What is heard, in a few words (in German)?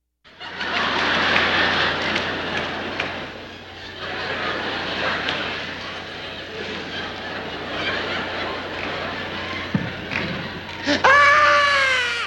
menge, Lachen